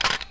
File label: Shutter; L600v